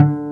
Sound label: W BASS D2, Bass Guitar; STACK BASS; AKAI S6000 CD-ROM - Volume 1